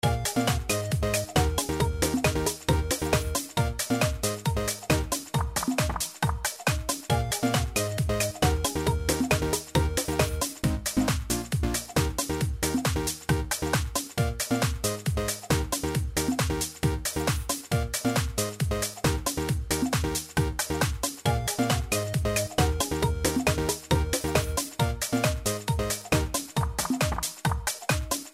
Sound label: Free Spin BGM; slots; Robot Vs Monster